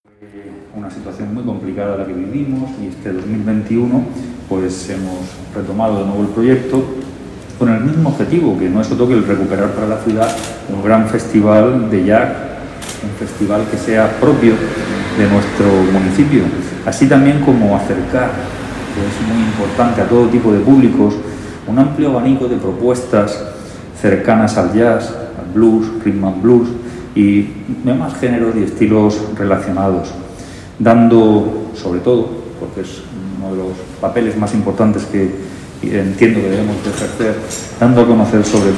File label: jazz